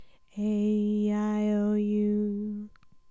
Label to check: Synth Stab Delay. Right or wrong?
Wrong